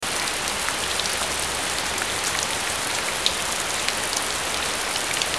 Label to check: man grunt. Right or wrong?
Wrong